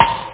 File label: pop!